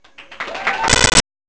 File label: Claps (Glitched); LG U8100